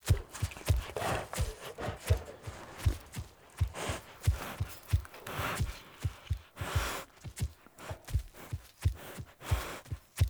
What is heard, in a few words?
Wolf Steps Walk Loop Default; Animals